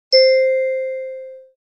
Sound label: Marimba